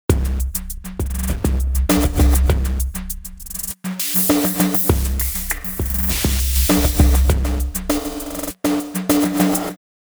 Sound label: bassline; sugar bytes; effectrix